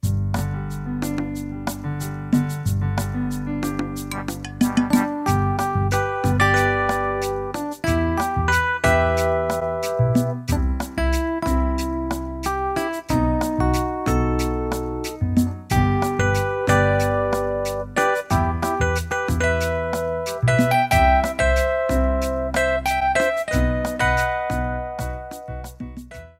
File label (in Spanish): Salsa